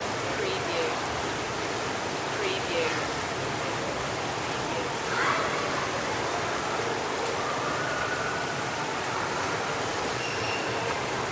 public pool ambience

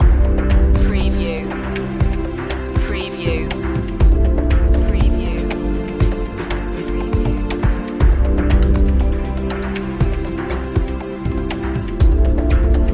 deep shimmer loop

